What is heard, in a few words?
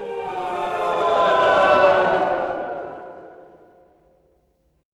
crowd boo